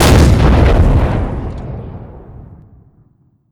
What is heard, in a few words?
75mm axis; emplacement